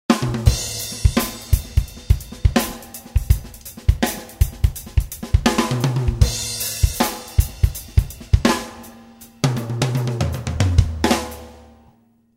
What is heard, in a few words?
drum